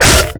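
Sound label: blade slice robot; melee impacts; Garry's Mod; tf2; mvm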